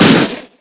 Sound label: Grenade